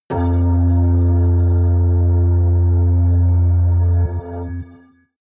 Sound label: metal organ, hits impacts